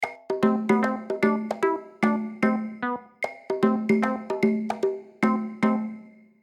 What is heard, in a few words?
Short mistery